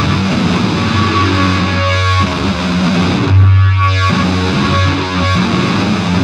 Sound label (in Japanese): buiiin